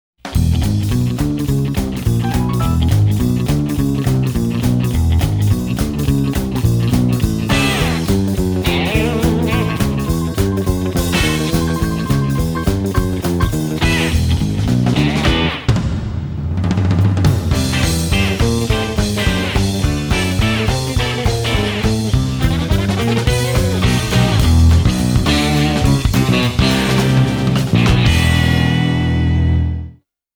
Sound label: rockabilly